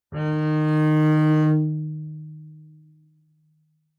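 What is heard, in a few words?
Bass arco fortissimo sul G Eb4, Double Bass; MIS Pitches - 2014; Strings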